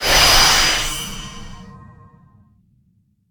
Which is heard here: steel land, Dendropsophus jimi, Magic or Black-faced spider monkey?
Magic